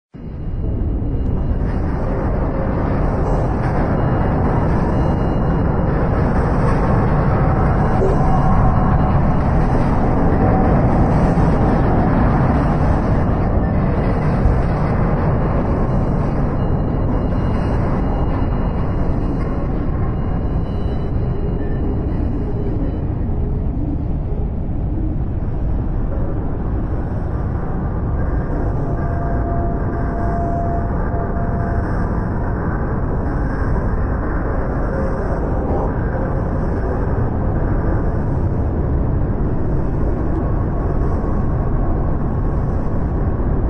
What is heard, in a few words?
industrial; aquanox addon; ambient / orchestral / cord / beat